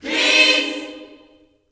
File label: PEACE CHD, Vocal Gospel; Spectrasonics; Vocal Planet Vol.1 Gospel; akai